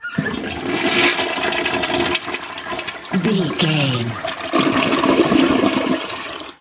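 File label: toilet flush lever water gurgle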